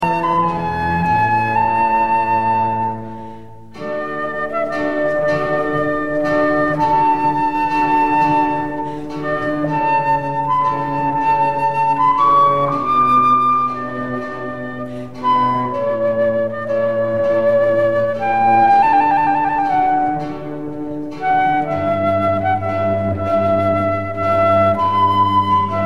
Flute Concerto In D; Vivaldi; Музыка Барокко

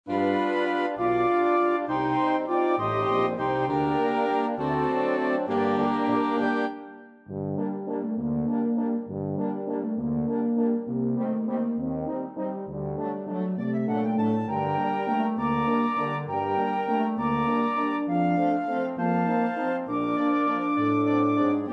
brass; Ангел с небес

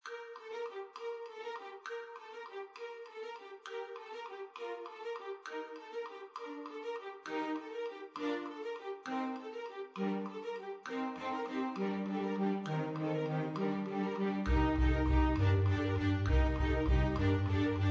Carol of The Bells

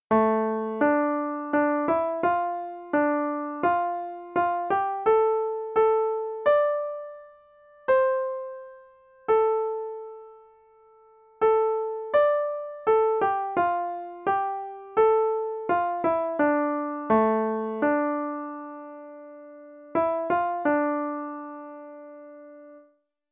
melody only; Shalom Shaverim

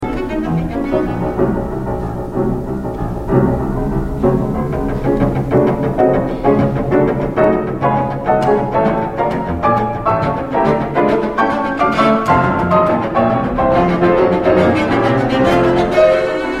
Cello Sonata; Музыка Романтизма

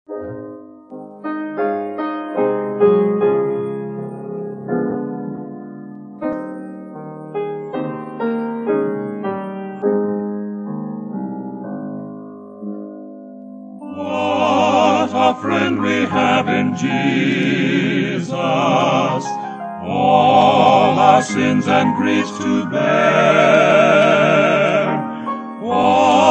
Quartet, Old Time Gospel; What A Friend We Have In Jesus

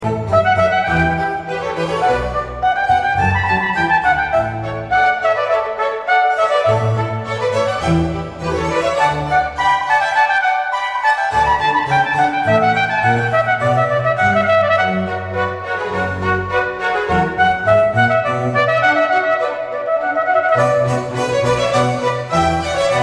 Concerto in D for trumpet; Telemann; Музыка Барокко

